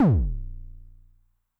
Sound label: BD MOD [Pitch+Depth+Overdrive], 909mod, BASS DRUM